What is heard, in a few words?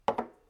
Cup